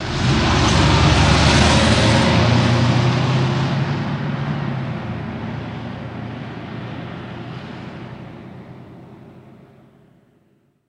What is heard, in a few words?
Cadillac Away To 40 Mph